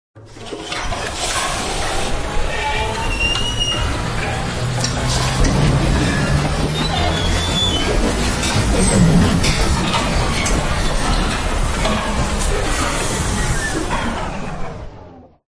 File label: Sci-Fi Power Up; Ryan Van Eerde